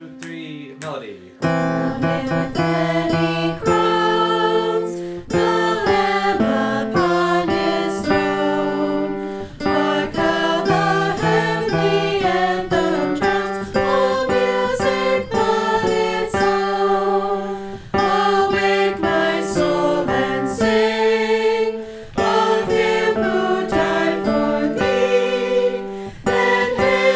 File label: TENOR, choir; Crown Him